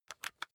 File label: rock drill head remove heavy; maschinen; bohrer; soundpool